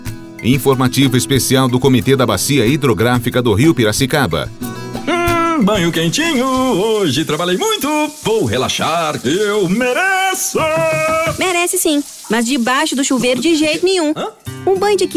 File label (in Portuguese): Cantando no chuveiro